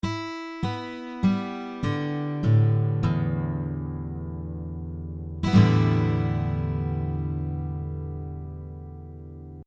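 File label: Guitar upstroke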